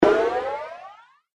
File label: Cartoon Rising Hit; Cartoon Sound Design